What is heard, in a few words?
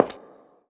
Hard Footstep